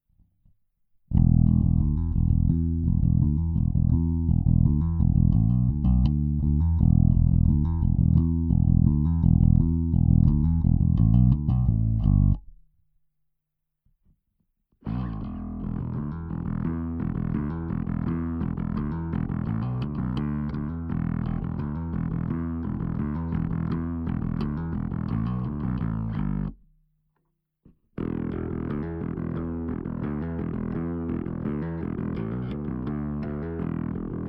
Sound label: Tight Out Rechts DI Links; BB-1X; Bogart